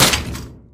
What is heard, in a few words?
impact weapon large metal; collide; large-weapon